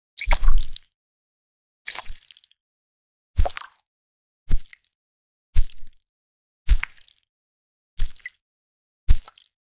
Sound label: a juicy fruit slice